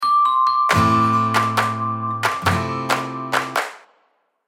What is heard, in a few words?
videocast transistion